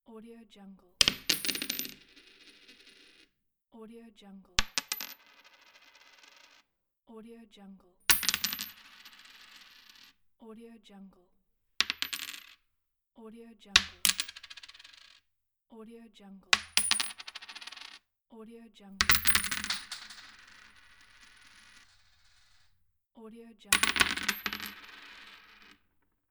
coins

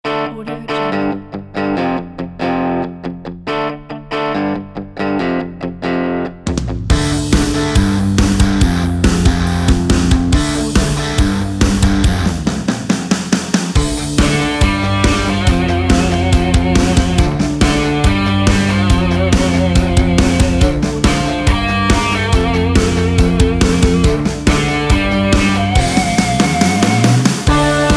powerful punk rock; musicday

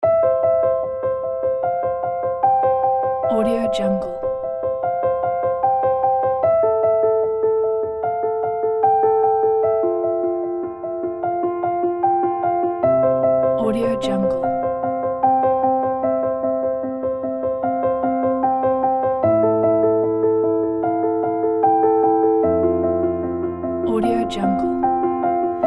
inspired piano

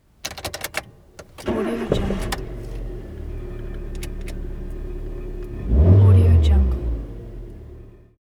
car starting

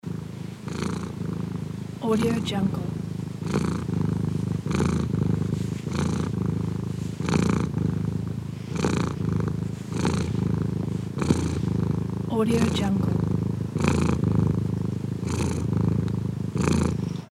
cat purr; alexstokke

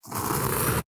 handwriting felt pen stabilo

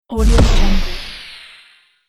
cinematic bass drum impact

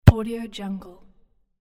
single soccer kick

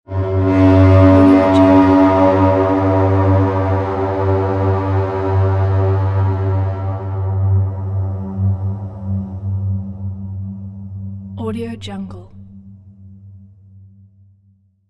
horn

